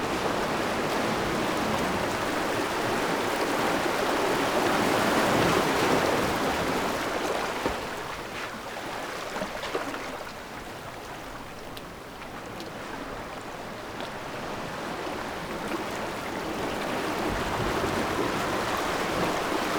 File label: waves